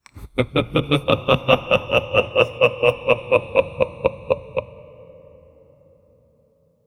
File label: a creepy long laugh; characters